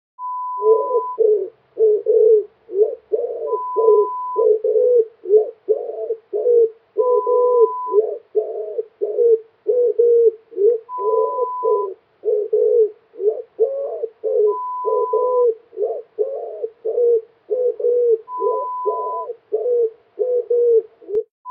Pigeon sound

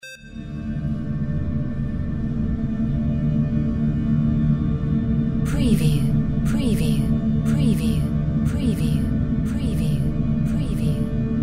DRONE BRASS GENERATOR

